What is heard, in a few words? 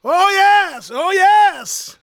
shouts, gospel preacher